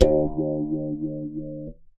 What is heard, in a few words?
bag finger; Univers Sons - Raricussions